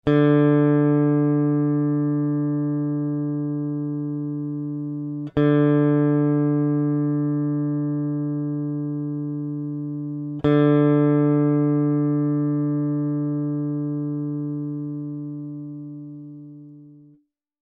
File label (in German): Stimmton d